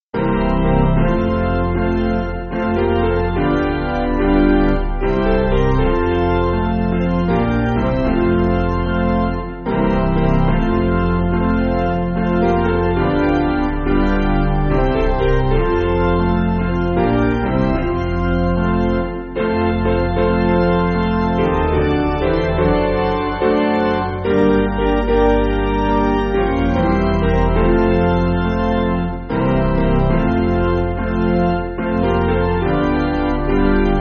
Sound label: Jesus Name Zulu Melody OPiano